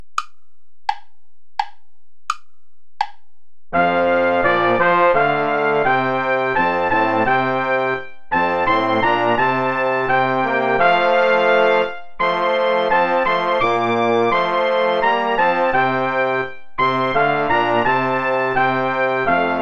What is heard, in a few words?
play along without trumpet